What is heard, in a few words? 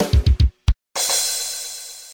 drums